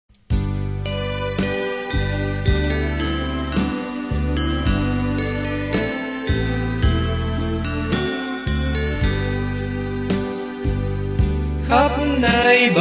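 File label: soprano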